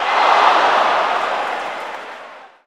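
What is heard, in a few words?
Basketball Crowd Reaction; Crowds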